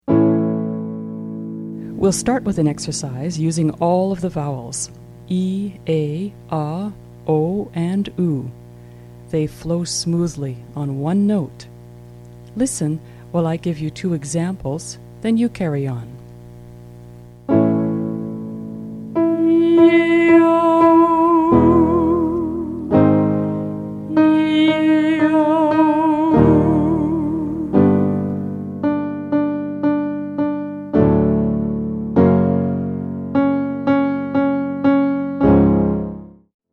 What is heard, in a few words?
woohoo